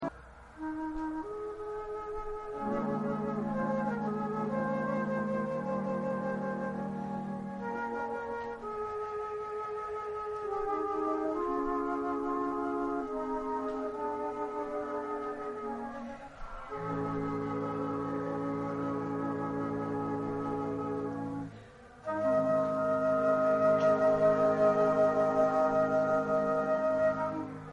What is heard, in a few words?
concert, flute; AUTUMN concert 2016